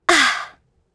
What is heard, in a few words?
Vox Landing; kingsraid